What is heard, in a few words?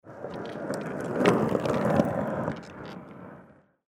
skateboard roll